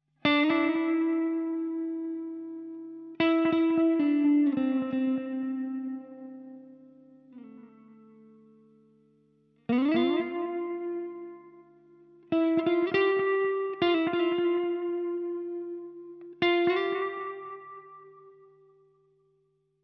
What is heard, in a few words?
Subtle Room Echo, echoplex delay; echoplex delay settings; Meris LVX